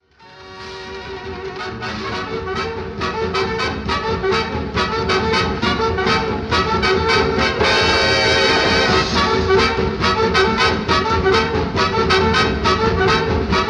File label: Drum Solo